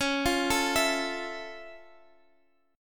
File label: F Augmented Major 7th C sharp